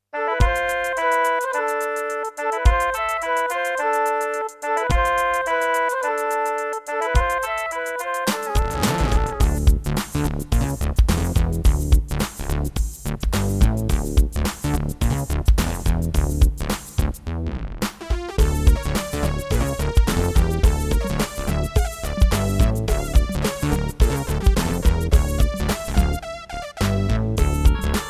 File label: Roland SC-88 55MAP; Lost Vikings